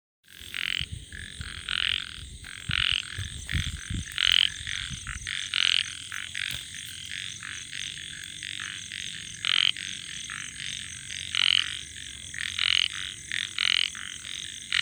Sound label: Microhyla ornata